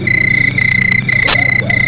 CRICKETS

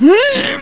GRUNT

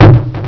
IMPACT

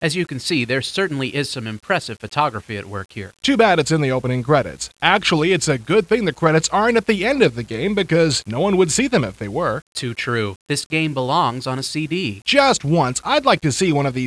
HARP

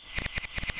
NEEDLES; KRONDOR

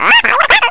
PARROT

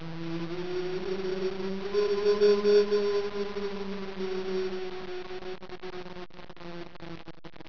ghost

